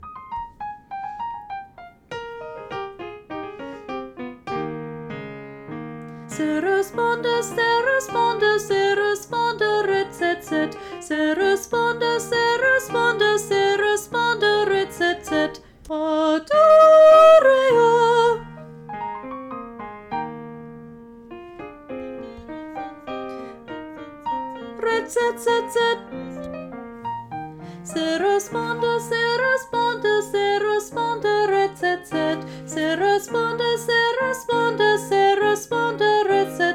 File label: Sarasponda Soprano